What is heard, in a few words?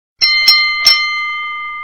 Wrestling Boxing Bel